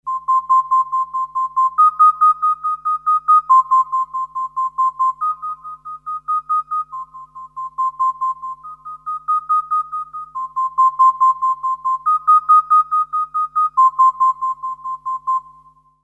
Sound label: Synth 8th Pulse 4/4; mfx chain